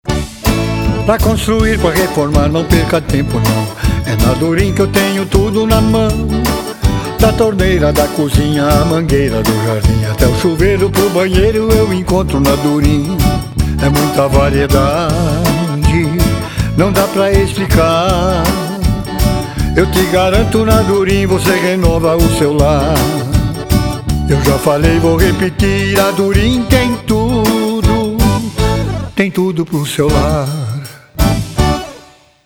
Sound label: jingle xote